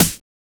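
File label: GROOVY SNARE; Drums In Your FACE!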